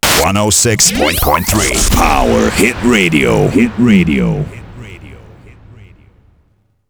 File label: Power Hit Jingle; Project Gotham Racing 2; radio; Power Hit Stockh